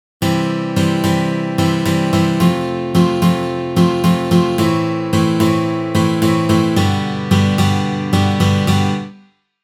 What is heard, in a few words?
guitar 8beat midi